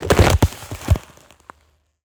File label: ice crack break; snow; Bluezone Corporation